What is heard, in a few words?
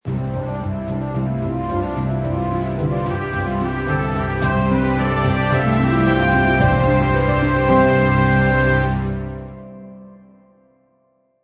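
Loop Orchestral Stinger; Orchestral Stinger 11 Seconds; Bumpers; Royalty Free Bumpers Music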